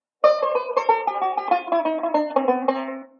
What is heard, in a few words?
Enclosure Variation, banjo; Beyond Chord Melody Volume II